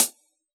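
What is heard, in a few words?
Hi-Hat Closed, Yamaha DJX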